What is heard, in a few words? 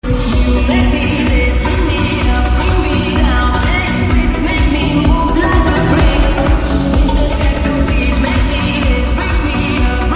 saxo